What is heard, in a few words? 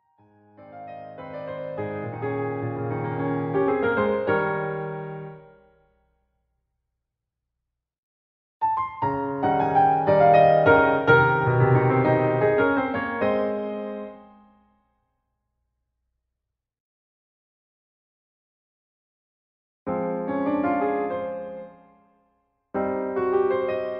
la coccinelle piano accompaniment A flat maj